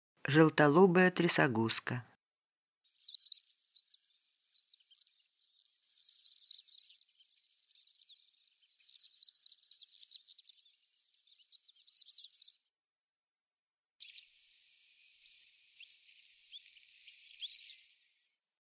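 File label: citrine wagtail voice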